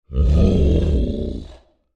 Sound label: Creature Large Growl Aggressive; Monsters; Horror; BigRoomSound